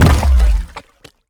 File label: invader alien scifi texture; science fiction; Bluezone Corporation